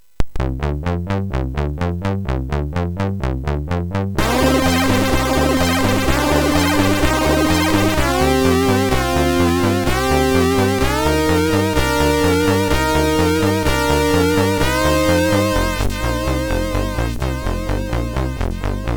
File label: Wooom, MOS6581R4; hvsc